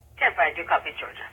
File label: wounded; Ready Or Not